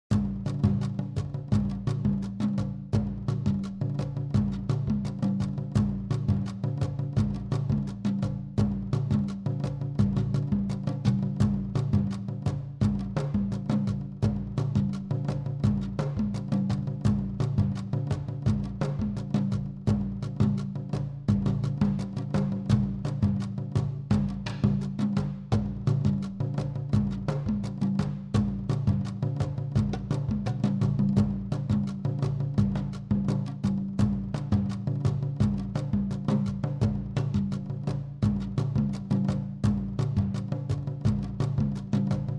Island Drums

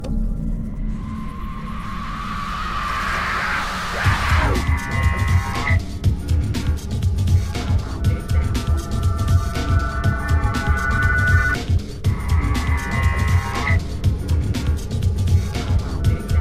Action Music Interior; True Crime New York City; Xbox

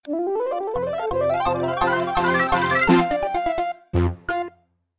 Score Card; Chuck's Challenge 3D

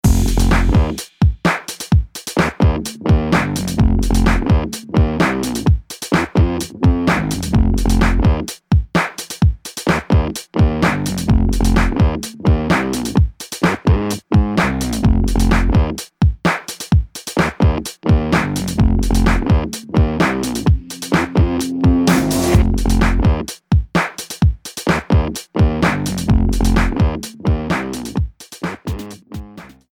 choose team

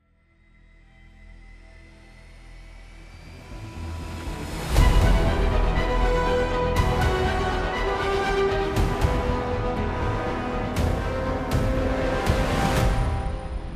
Character Select; HitmanHitwoman; SoloMode; Apex Legends Season 17 18; PS4 Switch Windows Xbox One

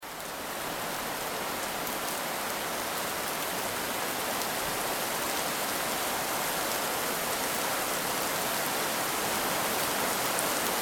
Heavy Rain Sound Effect; fractured minds

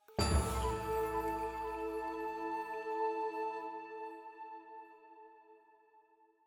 item Jingle Full + Choir Flute A

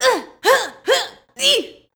female choke

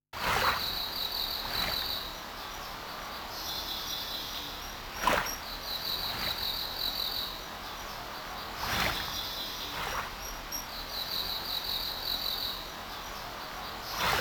Stage Ambient Music; snk arcade sound digital collection vol. 18; Okina of Genbu

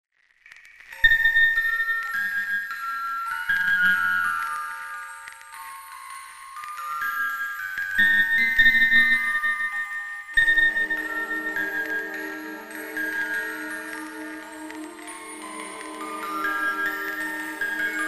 The Musicbox and the Violin; Pitiri

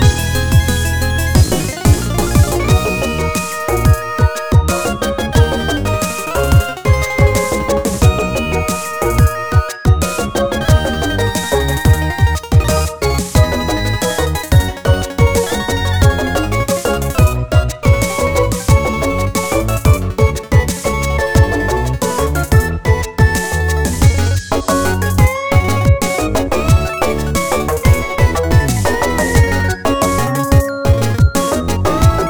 Stage back Loop; invercity

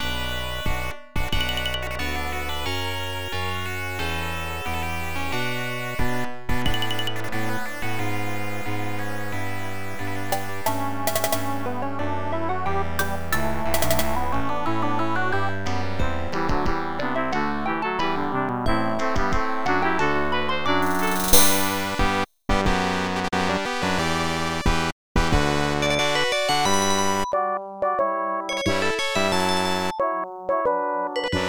Victory Theme; Space: 1889; IBM PC AT

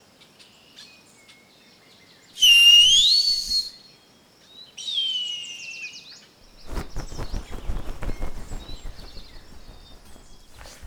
Falcon

